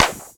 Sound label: Trebly Snare One Shot F# Key, snare drum tuned to the F# note